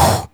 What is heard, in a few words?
Snare; Vengeance Samples